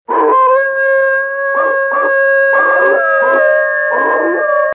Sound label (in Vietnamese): Tieng hu cua cho soi tren nui